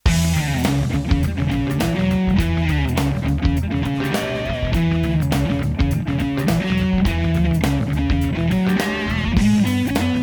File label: Shuffle Blues